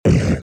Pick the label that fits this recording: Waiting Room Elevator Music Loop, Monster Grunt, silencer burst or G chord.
Monster Grunt